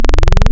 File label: Digital Simple Formant; Synthetic's Serum Collection; Echo Sound Works Core Tables